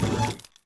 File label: suspension, car; Cars; MATER; XBOX